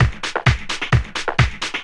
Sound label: drum 130 techno; Techno Trance Essentials